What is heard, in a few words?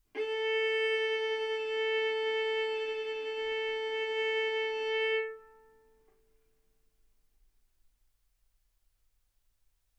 Violin arco A4 B4; mf; sul A; stereo